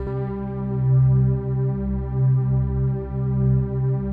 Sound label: PAD, ATMO PADS; Best Service; XX Large